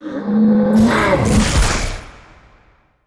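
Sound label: ghost chef attack, monster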